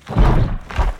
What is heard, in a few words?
TWEEZER PEACE DAMAGE